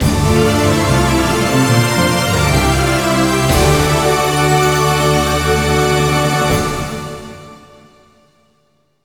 Stage Completed (Arranged)

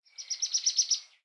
Ambience Menu Little Bird; Subway Surfers City; Android, iOS

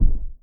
Kiwi Step Bass; lethal company

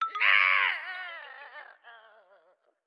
Skeleton Death

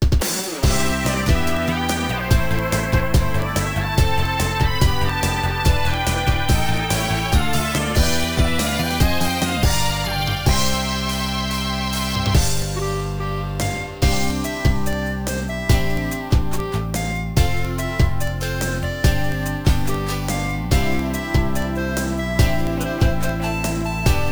Instrumental Version, Ending; TwinBee Yahho! Fushigi no Kuni de Ooabare!!; Konami GX

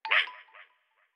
Skeleton Aggro; Going Under

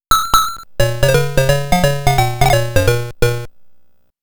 Game Over

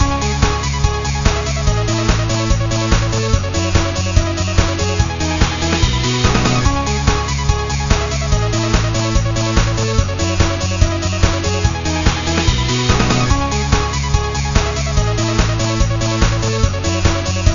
Race Start; Ferrari GT2 Revolution; Windows Mobile

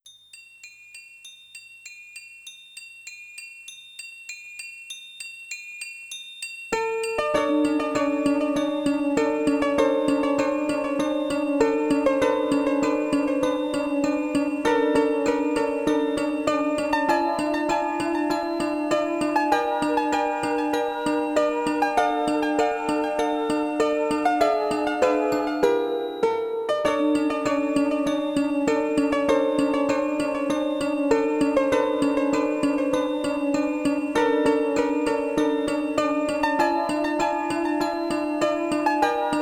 Main Menu [Roland LA]

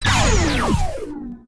Ball Slow Motion; Thunderwall Hit; gamerip; disney star smash; android; Gimmick

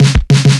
Fill 100BPM

